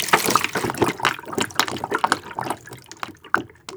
gascan pour

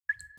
drip

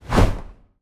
angel wing

